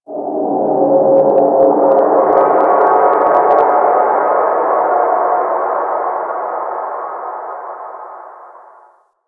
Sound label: Landscape Ambient Texture; Warframe; Ambience; Venus Open World; Textures